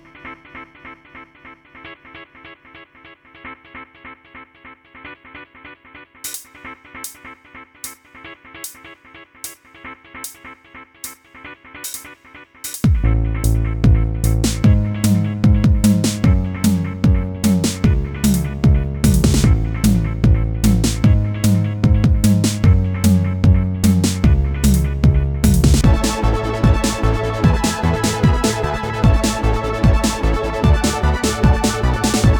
occasional cowbells save the blues

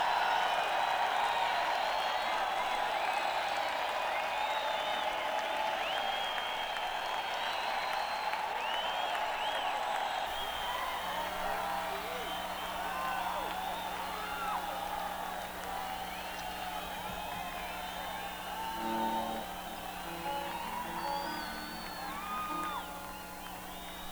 Encore Break; neumann km84i

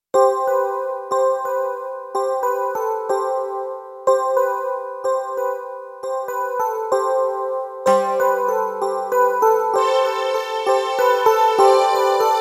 Clav Comp; Kawai K1

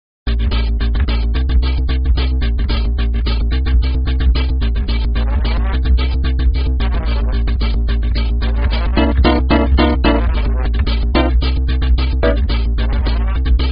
Jazz

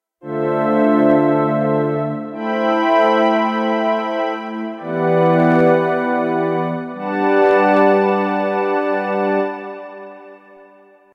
5th Pad, Kawai K1; Bank 2 card A

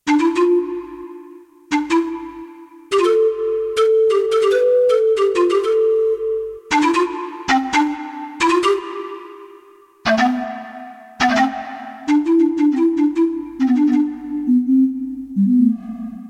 Pan Flute; Kawai K1; Bank 2 card A

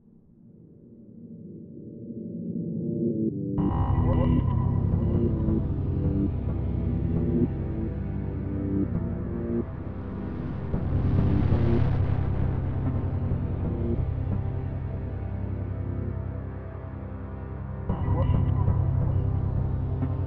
dirge

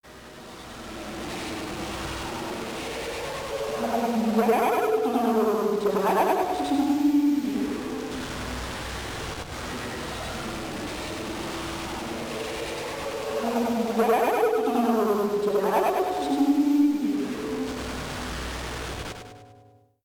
piano

